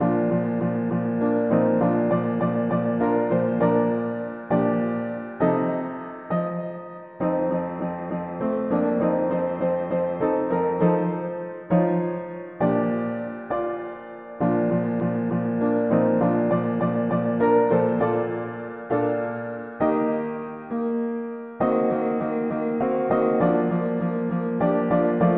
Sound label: mono piano; hymns; Dieu est fidele